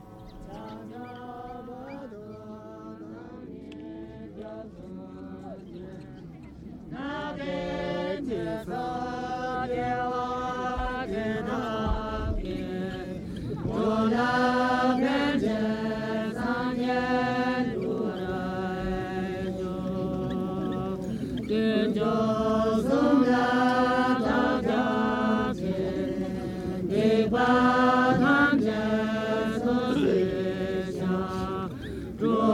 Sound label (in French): tibet musique